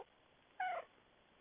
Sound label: cat meow